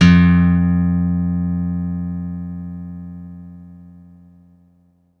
guitar F, GUITAR STEEL; MIRAGE

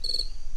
Crickets; Proteus 2000; Digital Sound Factory Proteus 2000

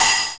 drum E2, DRUMCOMPUTER, KORG DDD-1; Retro Sampler

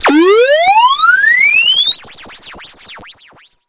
alarm, FX & ATMO, ALARMS & ALERTS

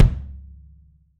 wood kick, 3D Drums

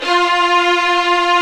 STR SW VLN, Vlns 8 fff, Agitato; String Master Series

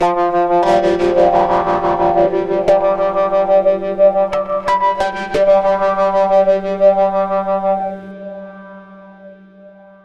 FX DIST; FX DISTO

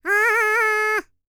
PERSIAN, World Voices; ILIO; Vocal Planet VOL-5

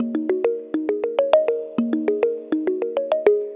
Tongue Mallet; Melodic; Ambient-Melodics-II

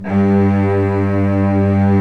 Vcs2 f vb; Roland LCDP13 String Sections; STR Vcs2 f vbx5

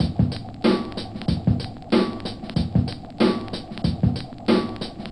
NYC Loop, drum loop; Yamaha DTXpress

